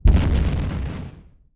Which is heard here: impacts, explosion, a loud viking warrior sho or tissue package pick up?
explosion